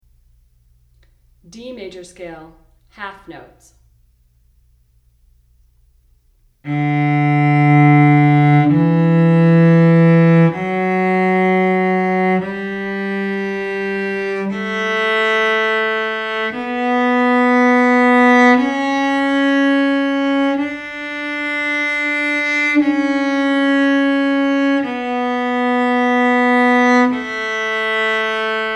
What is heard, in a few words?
Scale D M 1 Oct, cello; D Major; 1-Octave Scales